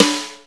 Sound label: Steel Snare Drum D Key